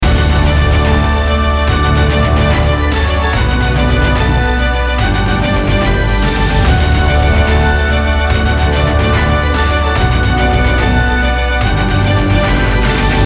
Winner Epic Loop

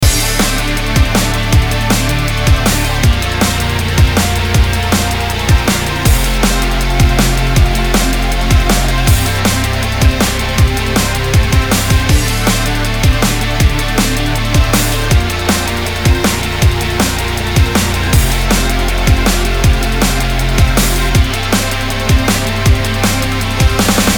Loop

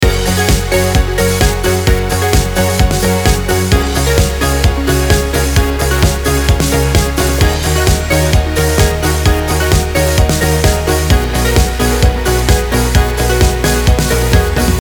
Summer Pop Retro Wave

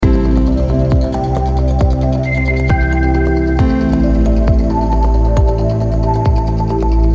Ocean Coast Loop

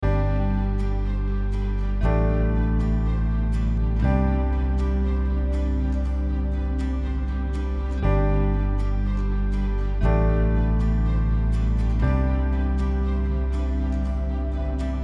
Aspiration Loop

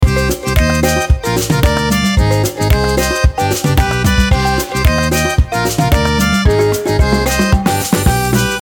Brazilian Loop

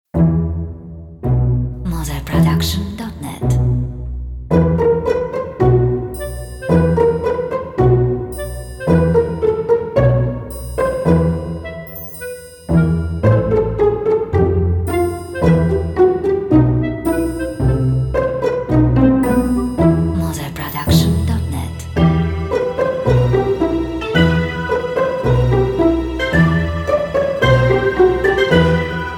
Mysterious Halloween Trash Comedy

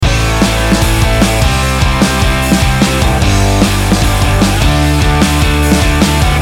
Rock Beer Party Loop

